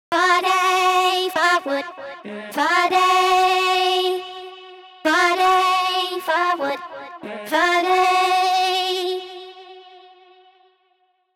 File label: chipmunk chop 97